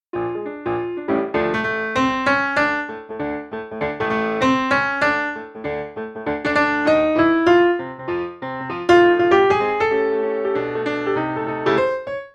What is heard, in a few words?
Melody; Genie; Mickey Wndr Book